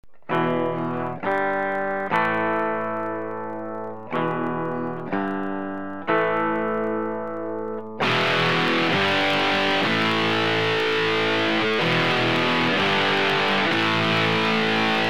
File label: DS-1 mod clean dist